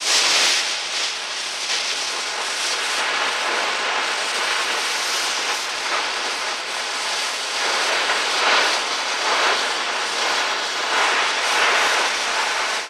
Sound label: Acetylene Torch, Long, Erratic; torches